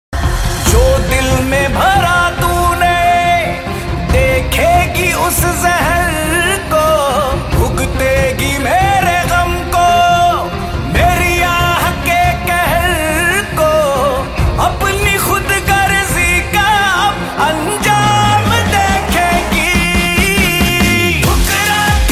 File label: Sad Song Ringtone